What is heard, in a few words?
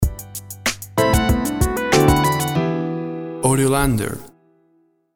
Upbeat Hip Hop Stinger; Upbeat urban hip hop rap music. A classic piece of Hip Hop and Rap mu